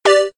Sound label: freeze